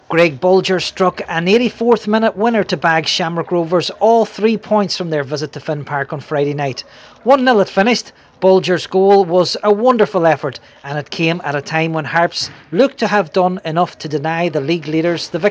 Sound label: harps